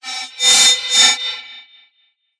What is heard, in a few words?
sound design effect distorted metal; sound effects one